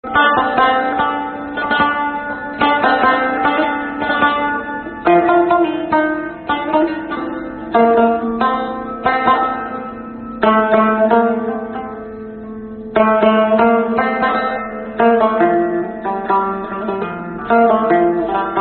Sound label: Instrumental